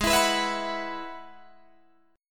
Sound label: G sharp Minor 7th Sharp 5th G sharp down Guitar DADGAD; G#m7#5 strummed